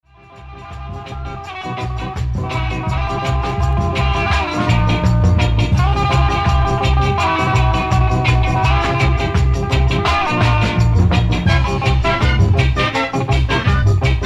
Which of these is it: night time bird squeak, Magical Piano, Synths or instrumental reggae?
instrumental reggae